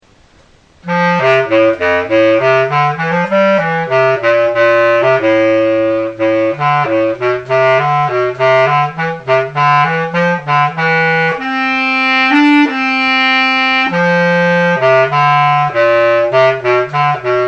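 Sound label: bass clarinet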